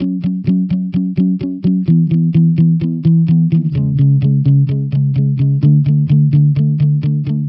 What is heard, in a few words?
Electric Pop Guitar 128BPM F#min; Electric Pop Guitars; Vengeance - Essential Clubsounds Vol.4; Guitarloops